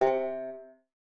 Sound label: Banjo Note; Bendy: Lone Wolf